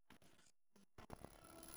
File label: bass